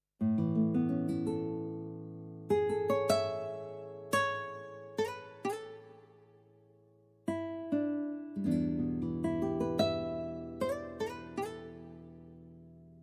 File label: Guitar; MOX6